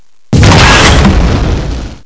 rocket launcher

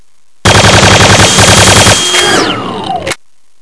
hyperblaster

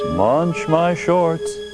munch

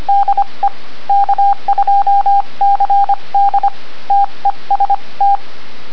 paddles

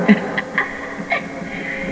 laugh

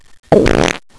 fart

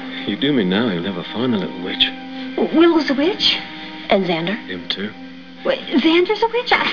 witch; spike

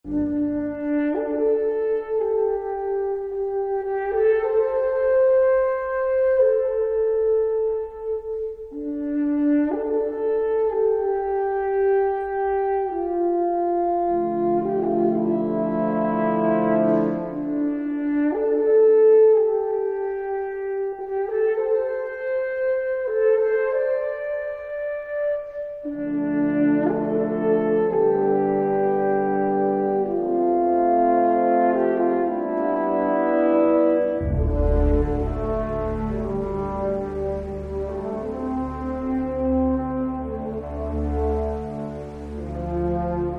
Gondor horn

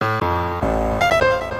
piano

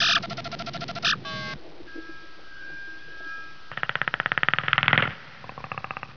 laser click

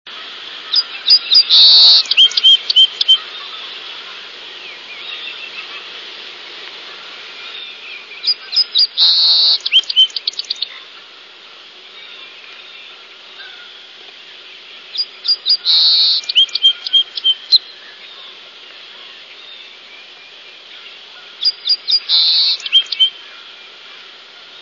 song sparrow; birdsongs

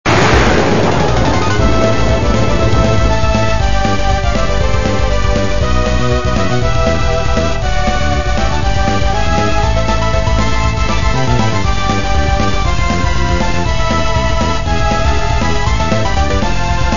battle

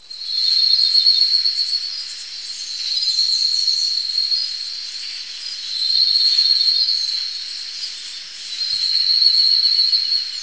Night Frogs

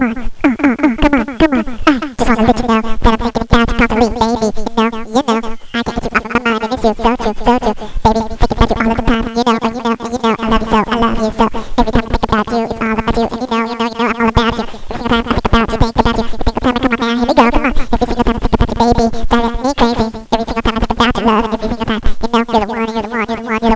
Rap

